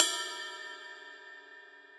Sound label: RIDE 22 cup; RIDE PE TW 22 cup; Ableton; Factory Packs; Drum Booth